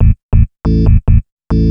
TECHNO BASS 140 A

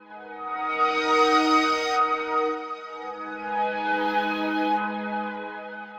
SYNTH PAD ETHNO 160 C, Sphere

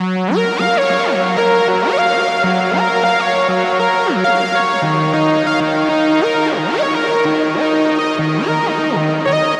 100 F#maj7 crash summer, Music Loops; Touch Loops; BlissedOutBeats

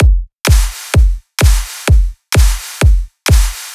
drum loop 128 Strp; Electro; drum loops; Anthemic

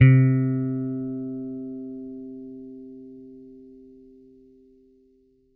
GOOD 80 FINGER C3, bass; Bass Mace; DirectWave

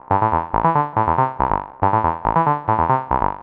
TECHNO SYNTH 140; Keys

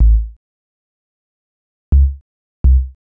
HOUSE BASS 125 A

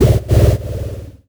NOIZ FX; ELECTRIC-SET

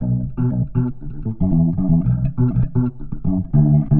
120 C Old Skool Funk, Warped Bass; Loops; Touch Loops; CosmicFunk

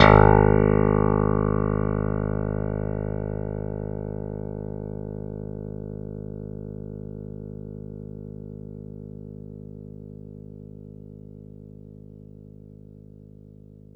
Clavi, Digital Keys

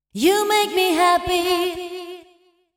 deep house female, 125, A, vocals

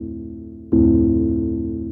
house synth 125 A, keys

